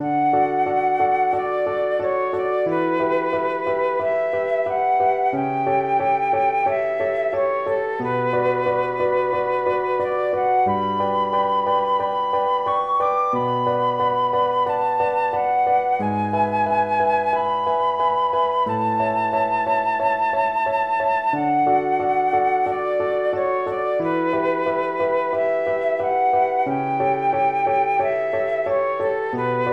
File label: flute and piano duet in d major